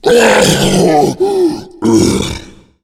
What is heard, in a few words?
tank death, zombie; Left 4 Fortress